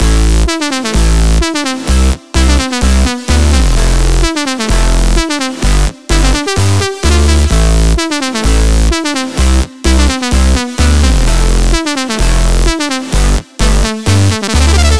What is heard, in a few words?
128 BPM A Min, house drop loops; Paradise; Cymatics